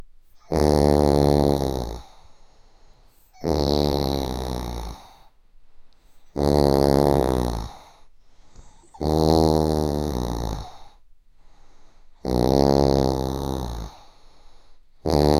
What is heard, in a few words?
5 years old boy, bedroom